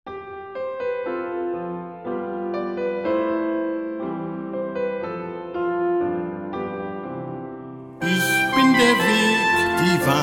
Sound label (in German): Kanon Gesang